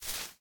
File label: Footstep Foliage Bush Small